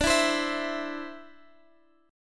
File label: D 7th Suspended 2nd, Guitar Drop D